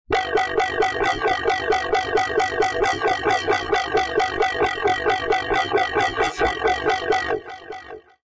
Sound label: Synth Mono